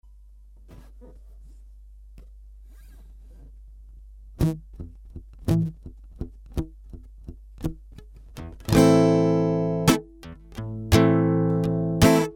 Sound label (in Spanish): Blues menor